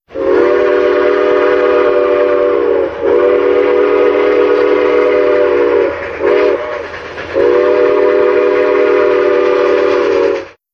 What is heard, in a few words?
whistle